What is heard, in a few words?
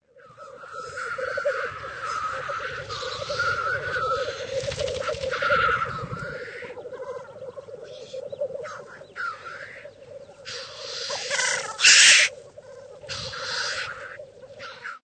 tetrao tetrix